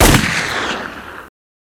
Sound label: gunshot